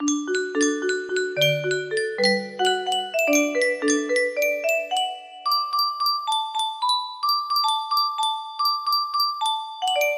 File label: music box